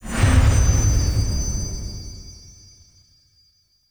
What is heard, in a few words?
Diorama Intro Stinger; Warframe; Duviri; Dioramas